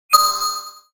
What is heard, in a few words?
Dead Ring